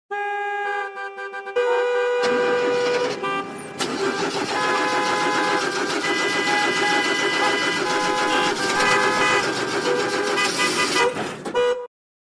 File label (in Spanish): trafico y coche malogrado